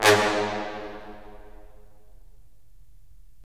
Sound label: BRS, Roland LA Composer